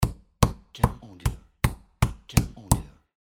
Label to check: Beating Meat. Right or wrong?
Right